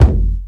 kick drum single shot g key

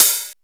sparkle open hi hat one shot g key

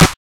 crisp acoustic snare E key

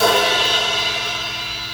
60s big crash cymbal single hit f key

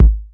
natural kick drum single hit A key

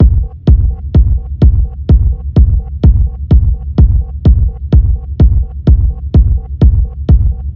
Techno Sharp Warm Reverb Kick; drum loops

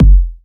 low tone kick sample F sharp key; kick drum samples; one shots

